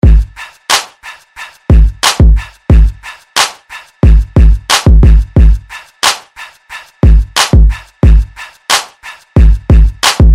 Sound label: cool beat ringtone